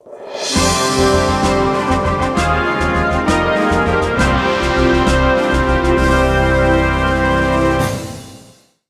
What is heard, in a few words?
Congratulations!; top angler real bass fishing; ps2 gcn